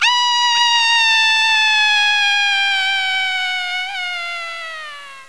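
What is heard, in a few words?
female scream; dactylsp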